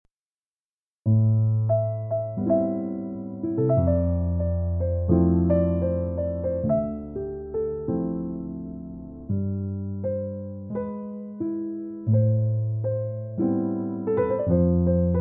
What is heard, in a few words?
Jazz Instrumental Chill, Jazz Piano; Valentines Day